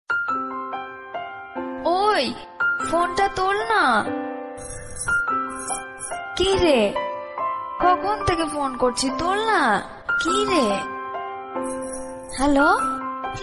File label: feeling romantic ringtone best mobile ringtone; black villain